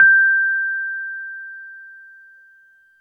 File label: RHODES EP2 G5; Sonic Reality - Vintage Timetraveler